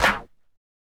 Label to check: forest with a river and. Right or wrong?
Wrong